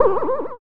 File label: slime